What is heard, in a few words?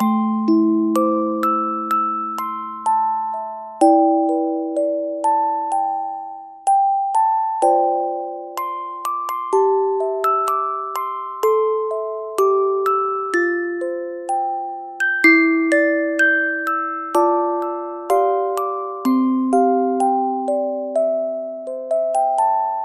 keys music box